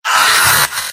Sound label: scout pain sharp; tf; mvm